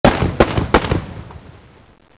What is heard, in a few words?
cannon